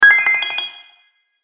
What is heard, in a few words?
Level Complete Game Fx; Video Game Sound Effects